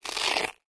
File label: velcro rip